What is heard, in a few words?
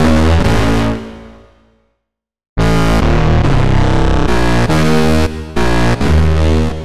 Dark Bass, Drum N Bass; Sonic Foundry (Sony Creative Software); Knutrix Drum & Bass